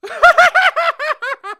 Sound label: Laugh